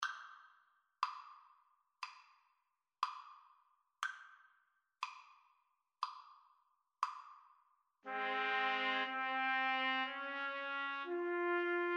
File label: romance 3TPT, Trumpet Trio